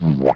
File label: bwomp; trol-bot